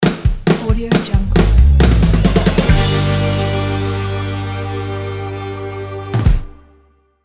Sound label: rock logo; docwaxler